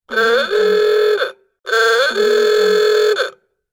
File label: car horn